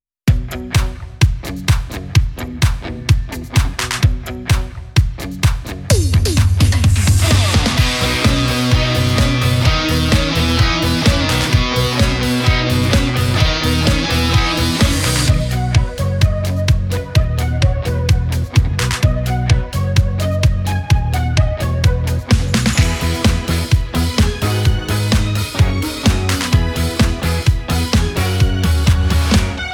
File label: Curtain Call ACCOMP; kos 2026 stars music